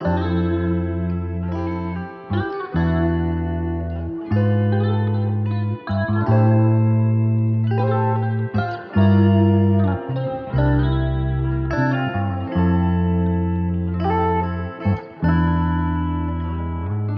77 BPM A Min, Guitar Loops; Got You; Cymatics; Journey Guitar Loops

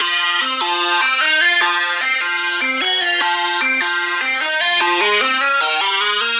Lead Loop F Obsidian, Dubstep, 150bpm; Ghosthack; Dubstep Sound Arsenal

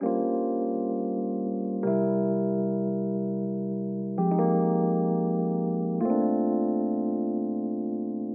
115 BPM F Min Rhodes; Loop Stems; Cymatics; Lovin U

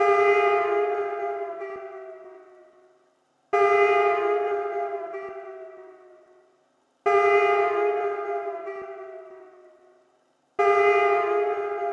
136 BPM F Min FX; Trap; Loop Stems; Cymatics; 2022 Melody Collection; Trap Pt 2; Hatred

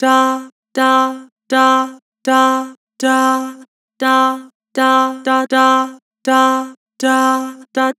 "Dah", Vocals; Harmor

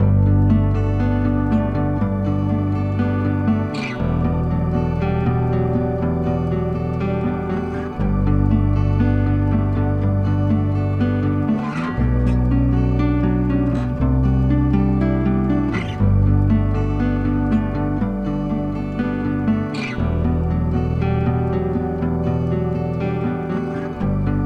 Journey Guitar Loops, 120 BPM D Maj; Bliss; Cymatics

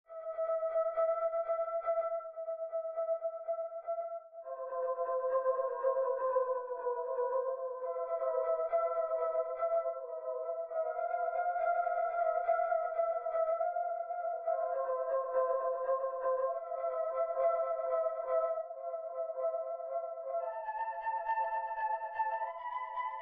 124 BPM A Min Lead, loop stems; Cymatics; 2022 Melody Collection; One Over

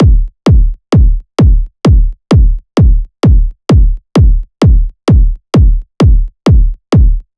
Kick 130, Techno Drums; Drum Kits; Stems; Ghosthack; Drum Kit 001

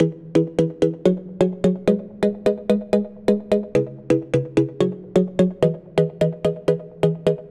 Soft Chord Loop 128 BPM E maj, EDM, Synths; Loops; Cymatics; EDM Starter Pack

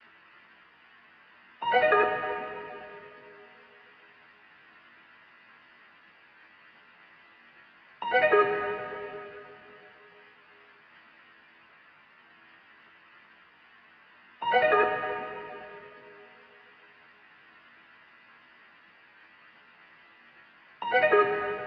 150 BPM G# Min Pluck, melody loop stem; Cymatics; 2022 Melody Collection; Wavy; Nebula

